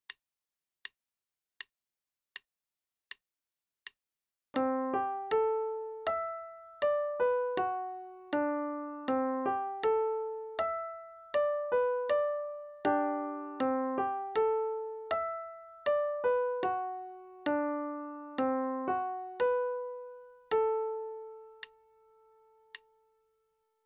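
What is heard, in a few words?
Play-a-long, quarter note=80; Roubos; The Peacock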